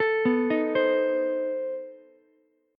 B 7th Flat 9th B down Ukulele C6; B7b9 arpeggiated; chord shape; JGuitar

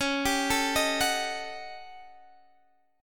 D sharp 7th Sharp 9th Flat 5th

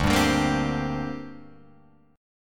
D 7th Suspended 4th Sharp 5th down Guitar Open F; D7sus4#5 strummed

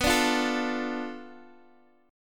B Minor 7th Flat 5th B Guitar Open D

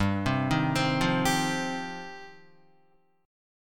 G 7th Suspended 4th Sharp 5th, Guitar

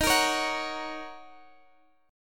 Gb Major Eb, chord; Gb/Eb strummed; JGuitar

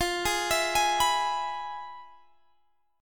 F Minor Major 7th Flat 5th Guitar Standard; FmM7b5 arpeggiated; JGuitar

